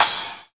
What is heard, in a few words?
Crash Short TR909; pitchglitch